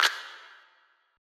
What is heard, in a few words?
Snap